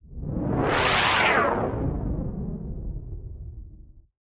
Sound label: Anime Air Movement